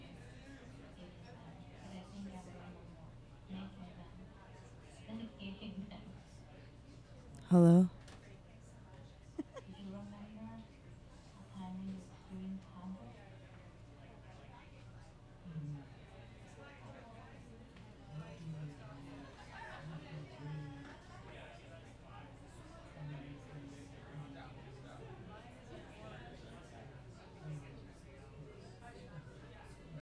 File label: Audience Recording; a-meal-premiere